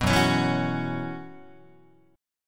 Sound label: F sharp 7th Suspended 4th, Guitar